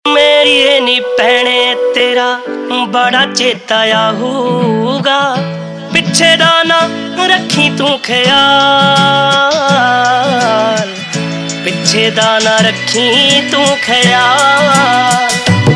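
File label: Ringtone, Punjabi Pop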